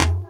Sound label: tom, dance drums